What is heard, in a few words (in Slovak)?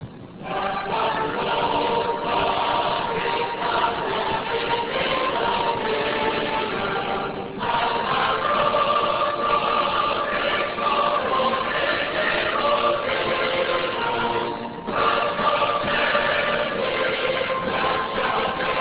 hymna